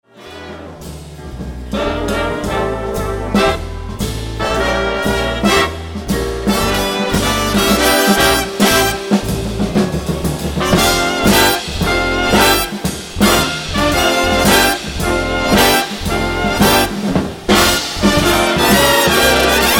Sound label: Blues